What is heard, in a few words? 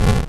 explosion